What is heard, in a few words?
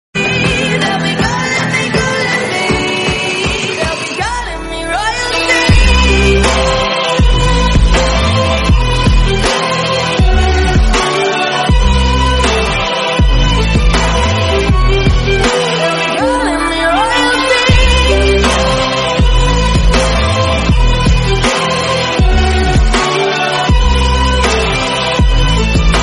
remix bgm